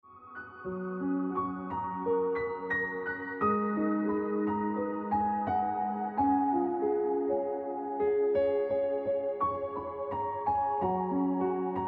Ringtone Piano Music

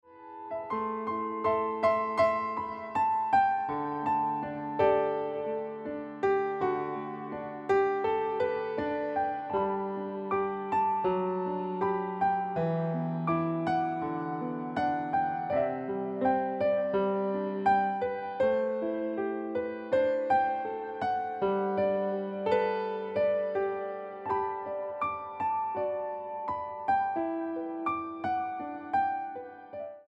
Ringtone

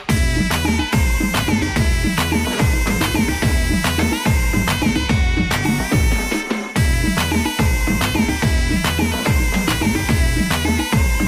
Movie Bgm Ringtone; Brother

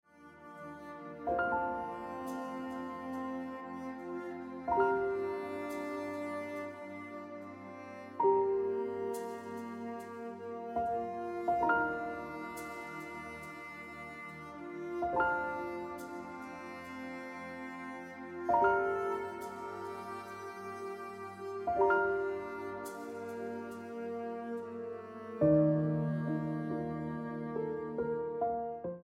Emotional Life Ringtone Piano Music

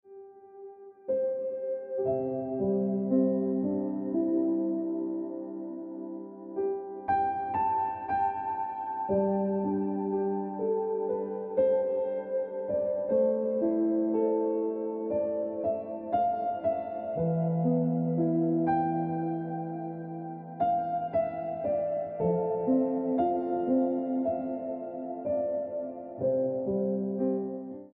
Ringtone Piano Music

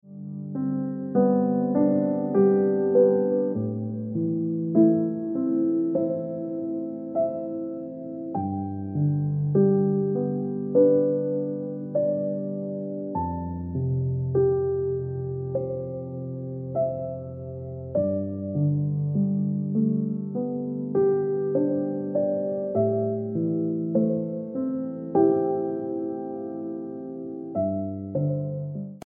- Instant Ringtone Piano Music

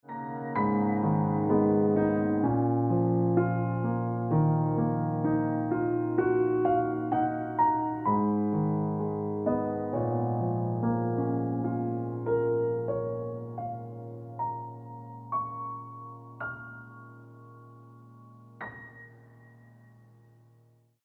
Exception Ringtone Piano Music

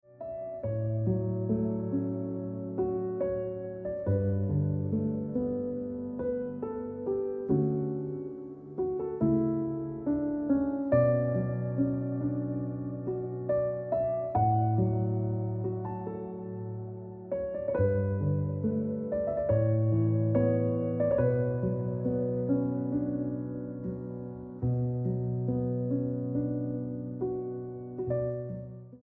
Piano Music

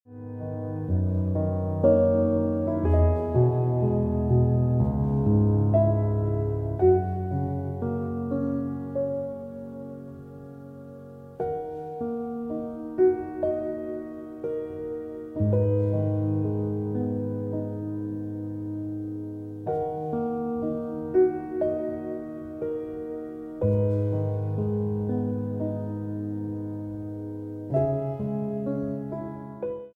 Dont Leave Ringtone Piano Music

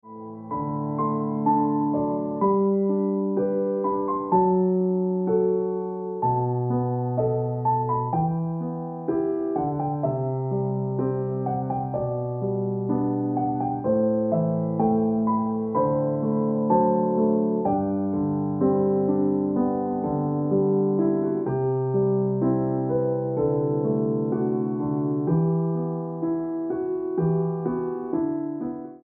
Piano Music

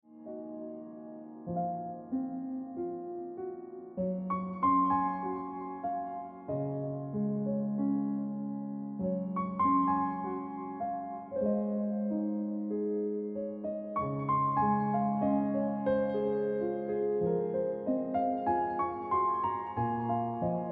Ringtone Piano Music

